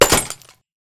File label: Glass